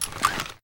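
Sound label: sniper drop over shoulder; viper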